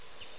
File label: exclam; peach